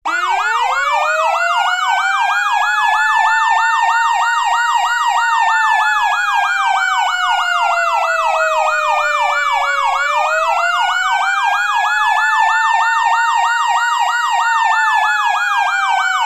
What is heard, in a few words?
mechanical and yelp, siren; sa441M